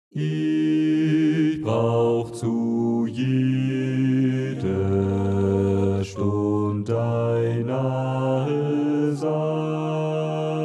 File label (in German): Bleib bei mir herr Bass